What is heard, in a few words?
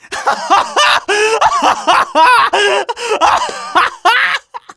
Scout laugh long